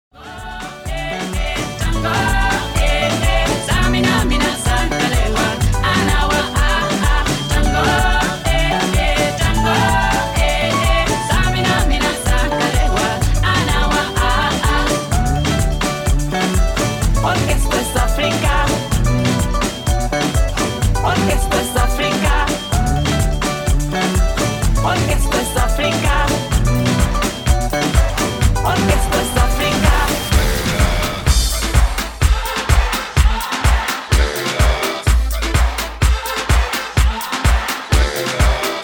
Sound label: Latin Mix